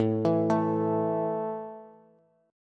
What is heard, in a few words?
A 5th A down Bass; arpeggiated